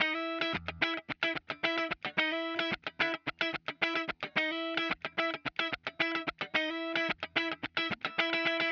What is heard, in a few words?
Electric Guitar, Loops; Guitars